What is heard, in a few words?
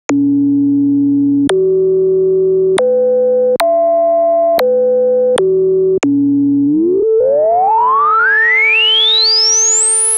minimonsta filter; MiniMoog; gmedia minimonsta; ohmforce